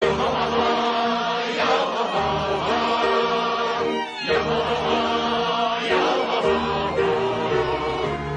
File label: Yohohoho